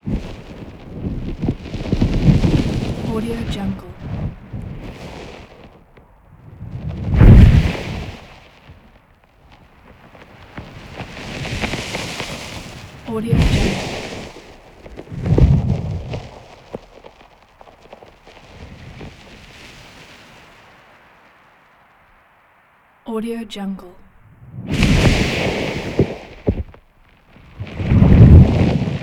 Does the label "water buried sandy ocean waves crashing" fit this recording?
Yes